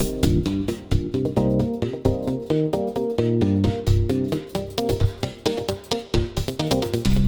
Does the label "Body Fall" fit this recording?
No